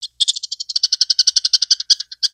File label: Pets Skunk Ecstatic; creatures pets; Lego